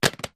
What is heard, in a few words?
Objects Fall Various